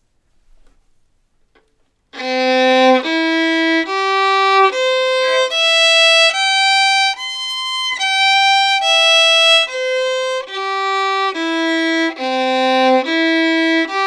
Arpeggio C Major

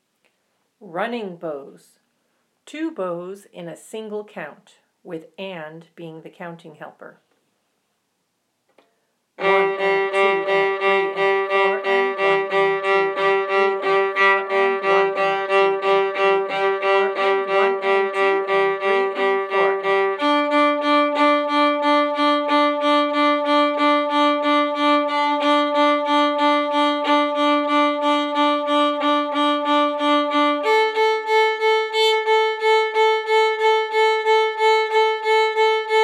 Running Bows

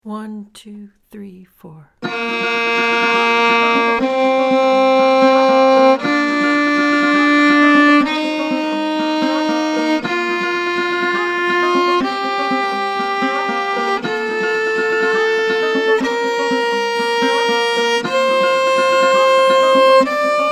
Bb scale 2 octave

